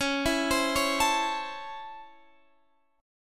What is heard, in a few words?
C sharp Minor Major 7th Sharp 5th C sharp, chord